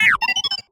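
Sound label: Sci-Fi Droid Robot Emote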